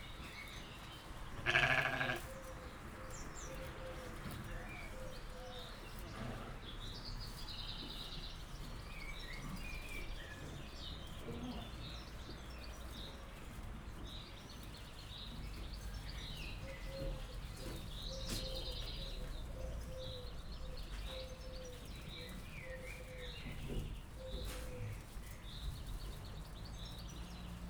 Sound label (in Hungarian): hodályban, juh; jaszberenyi zoo 2016